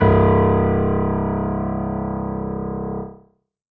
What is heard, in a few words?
keyboard acoustic, acoustic grand piano